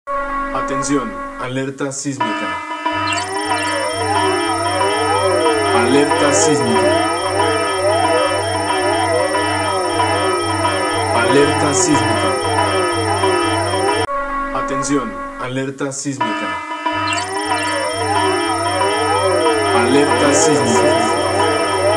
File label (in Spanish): ALERTA SISMICA